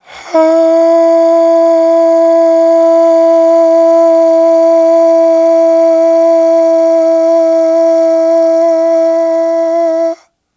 AHHH, Vocal; Polyend

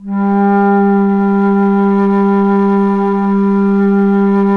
ALTO G2, ALTO FLUTE; USB Soundscan vol.23

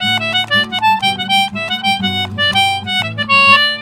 D C4, Celtic Flavours; USB Soundscan vol.42; AKAI

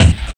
RSS, 160 BPM

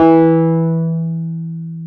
PNO, Wurly

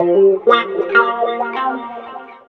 GUIT; East West - Phat & Phunky

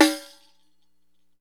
REQ OPEN; Deff Bendir &Req

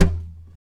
TAP, FLAMENCO Guitars; Spectrasonics; Hans Zimmer Guitars VOL-2

